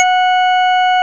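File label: Percussive Organ C5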